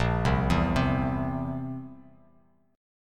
A sharp Suspended 2nd Flat 5th A sharp, Bass Standard; arpeggiated; A#sus2b5; JGuitar